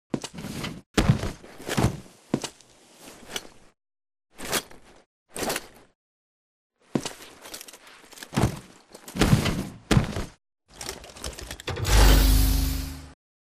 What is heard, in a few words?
taunt foley scene; Titanfall 2